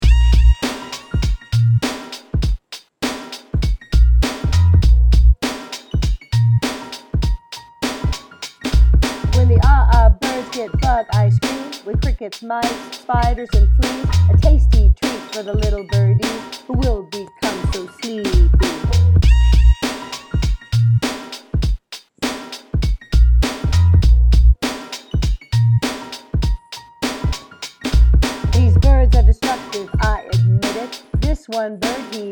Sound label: Bug Ice Cream vocals